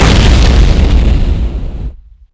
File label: srocket explode, weapons